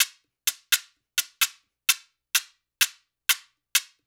LOOP, DANZON, Percussion; InVision Interactive; Percussion Slam

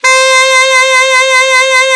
ALTSAX D3; Best Service; Alpine Volksmusik

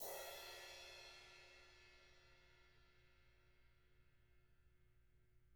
BRUSH CYM

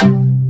VOCODER DRUM

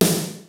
PHAT TRAX KIT, DRUMS; Kid Nepro; Elite

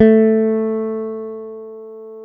A 2 JAZZ GTR; Univers Sons; Jazzistic

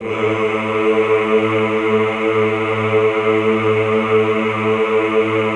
EHH, Classical Choir; Best Service; Extended Classical Choir; EHH LO+HI LL

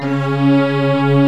SYN BRS & CHOR

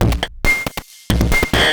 prc 138, percussion loops, Techno; PS-45

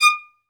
Synclavier Strings, SOLO VI; ILIO

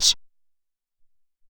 Noiz Hat, Alesis SR-18

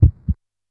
Heartbeat, Yamaha PSR-E323 SFX Kit

